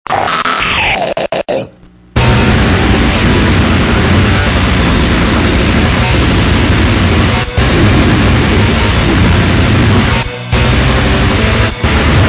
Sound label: drum machine gun